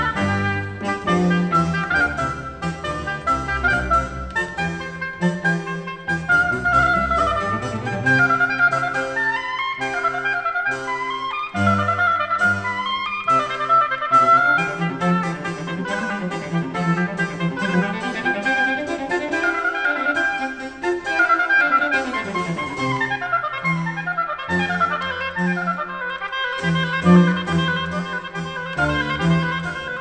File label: classical